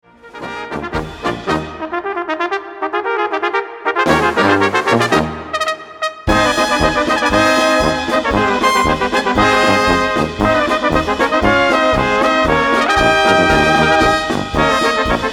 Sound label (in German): Polka